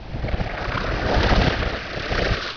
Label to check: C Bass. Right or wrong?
Wrong